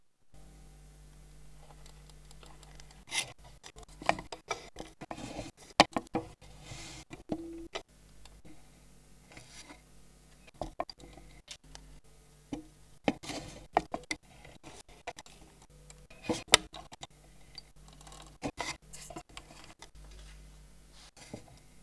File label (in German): Nebengeraeusche algorhythmisch, spieluhr